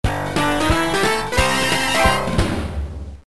Level Clear; Donkey Kong Country 3; GBA

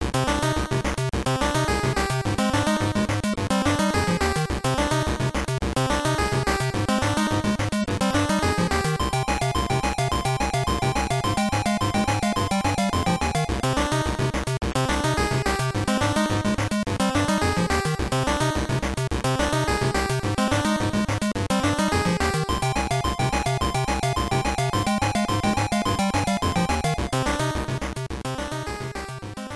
Game Over; Battletoads

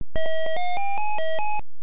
Game Over; Cookie

